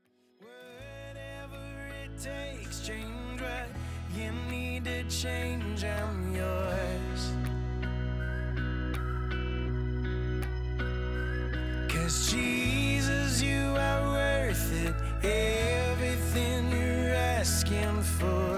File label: heartbeat